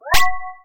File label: adrenaline full sound effect; terraria; calamity